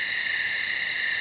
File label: night